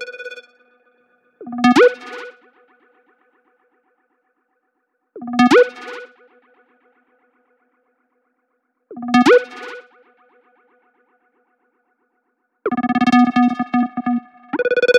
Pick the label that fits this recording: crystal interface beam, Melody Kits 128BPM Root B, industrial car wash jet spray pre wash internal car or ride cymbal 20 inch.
Melody Kits 128BPM Root B